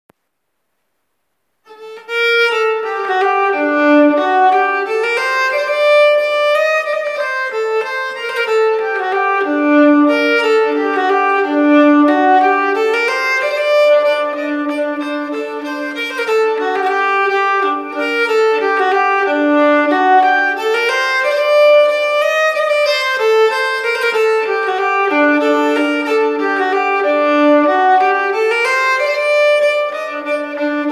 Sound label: polonas fran Skane, nyckelharpa; octobresaintdie